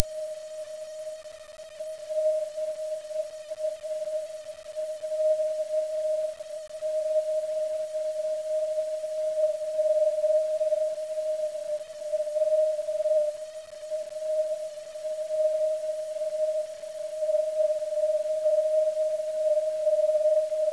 vox

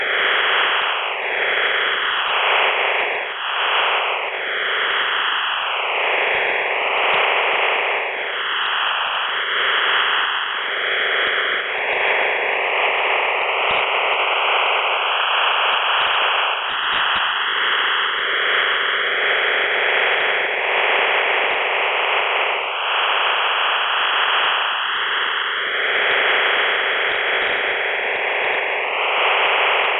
modem

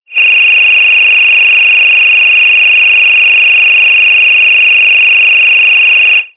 mhz nfm

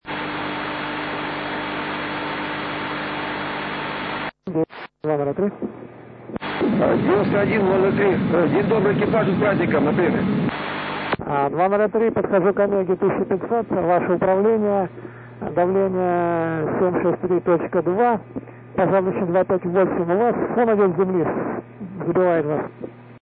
noice

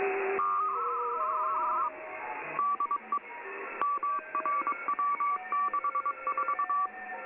winmor

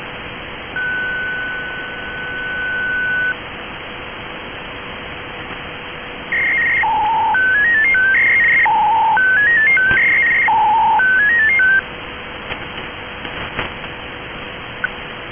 86505khz usb selcall msk fsk 125bd mfsk

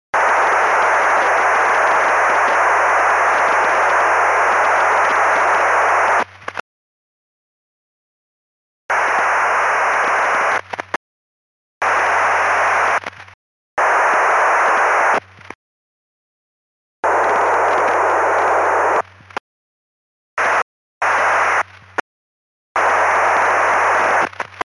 signal

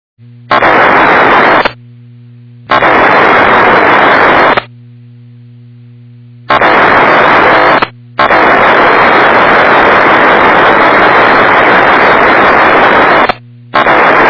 digital p-25

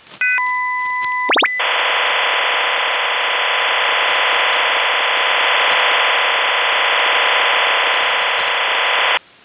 1 block ofdm 98

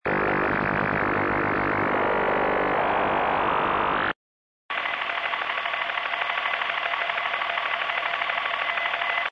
99khz; left degen rig

